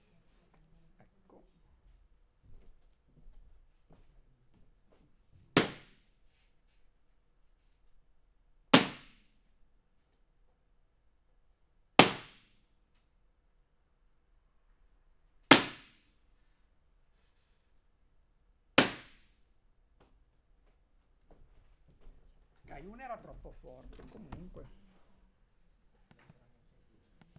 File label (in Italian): Tempo Riverbero Aula